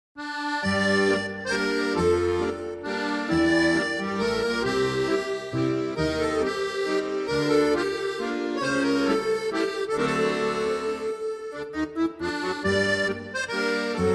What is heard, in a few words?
Frisian Accordion Waltz